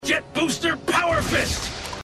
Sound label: Jet Booster Power Fist; Prowl; Robots In Disguise television show